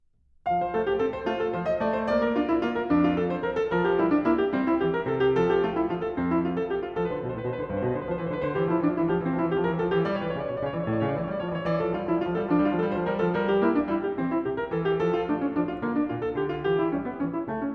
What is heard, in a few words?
Prelude No. 11 in F major; Well-Tempered Clavier; BWV 856; Bach; Book 1